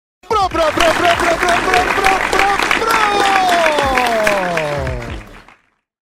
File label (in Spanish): Bravo Aplausos